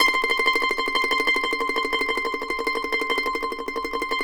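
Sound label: mandolin tremolo C6; Sampleheads; Whole Lotta Country 1 & 2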